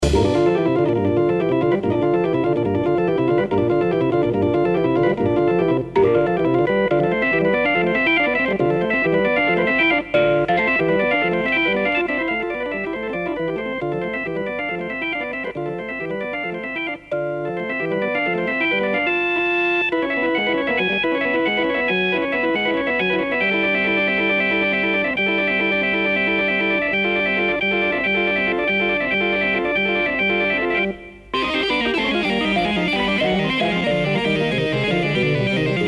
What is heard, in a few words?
organ bridge